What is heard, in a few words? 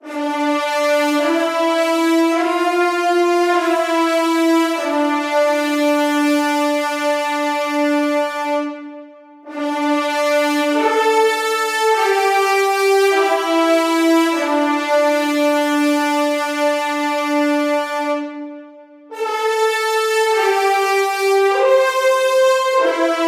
Horns - Dm 100Bpm; Construction Kits; STEMS; Ghosthack; SkyRealm; Kit 26 - Homeward Bound